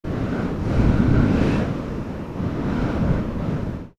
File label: wind synth